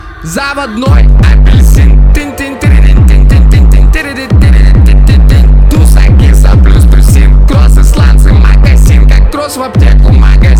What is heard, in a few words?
Bass